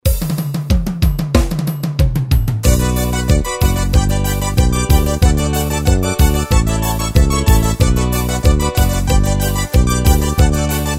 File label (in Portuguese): forro